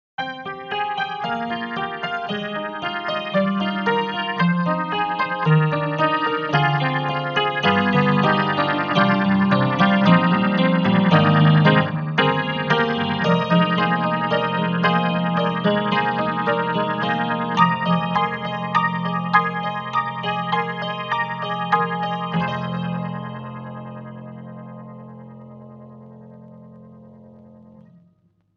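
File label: Upright Vinyl Vibrato, piano; Spectrasonics Keyscape; Wing Upright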